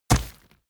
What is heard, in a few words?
horse step dirt